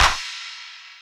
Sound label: Snares, Elektron MachineDrum SPS1 MKII